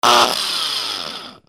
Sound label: GARAGE